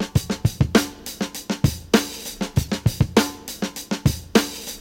100 bpm breakbeat sample c key, Free drum beat - kick tuned to the C note